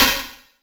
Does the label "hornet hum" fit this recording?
No